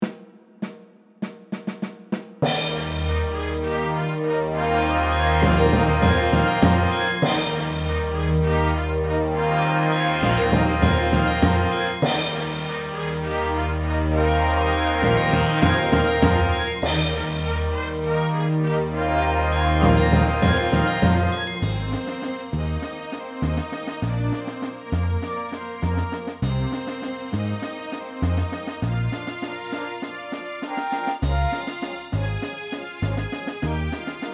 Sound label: Brass Bb Backing